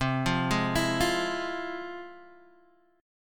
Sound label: F Minor Major 7th C, guitar chord